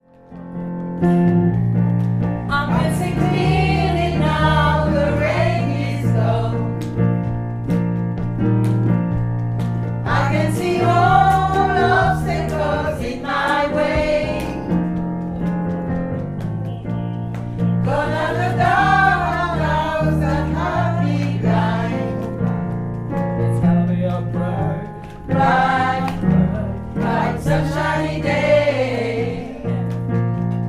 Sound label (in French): couplet mezzo; couplet 3 & fin; gospel